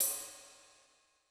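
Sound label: Rock Sizzle, Cymbal; Yamaha DTXpress-II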